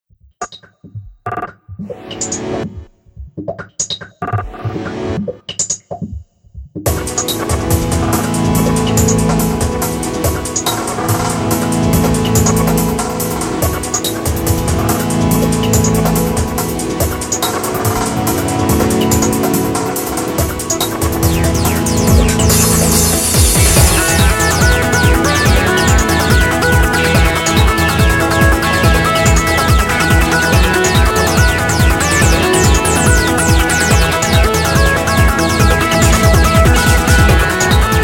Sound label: Instrumental